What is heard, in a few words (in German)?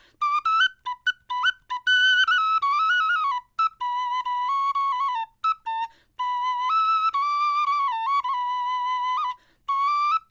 melodie, knochenfloeten